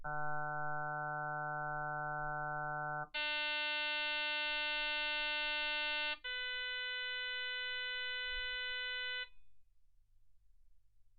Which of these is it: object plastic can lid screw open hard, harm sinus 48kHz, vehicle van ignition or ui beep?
harm sinus 48kHz